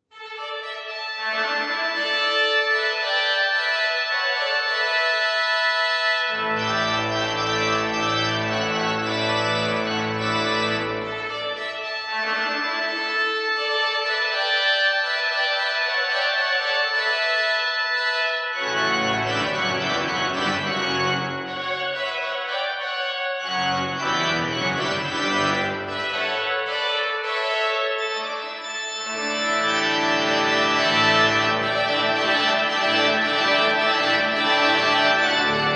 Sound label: Fanfare in D major